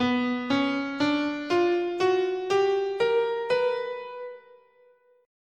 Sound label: B Hungarian Gypsy